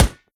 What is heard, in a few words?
rubber, bullet impacts; physics; Half Life Model Pack